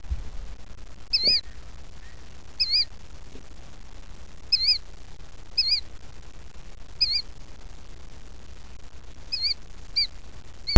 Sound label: killdeer